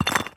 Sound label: stone break